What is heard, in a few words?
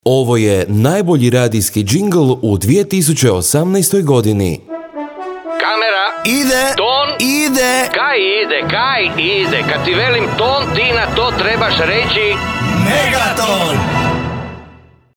JINGLE; KAMERA TON MEGATON